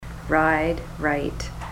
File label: ride right